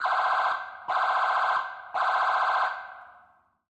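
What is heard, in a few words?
exterior emergency siren; Sonniss GDC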